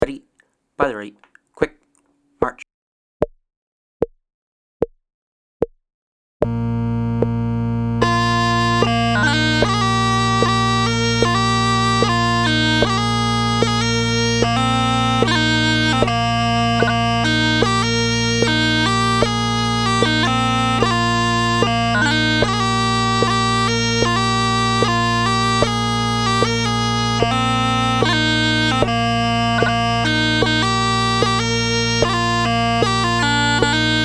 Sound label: 75 bpm